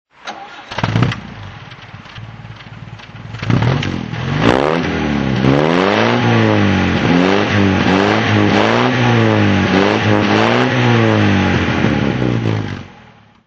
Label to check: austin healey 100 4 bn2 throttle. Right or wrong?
Right